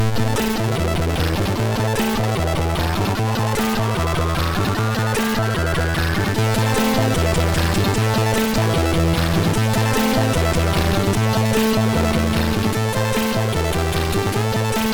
Coins, MOS6581R2

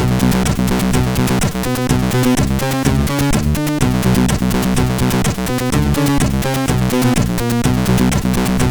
Disco Rythm, MOS6581R4; sid

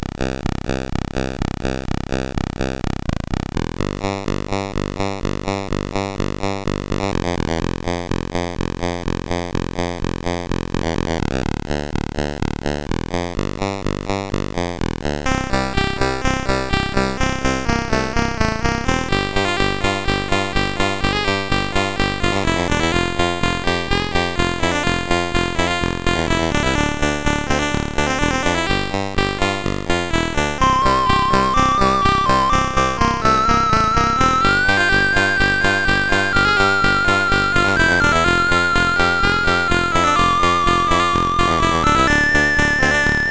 Turbo Modul, CSG8580R5

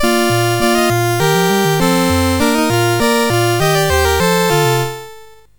Ditty, CSG8580R5

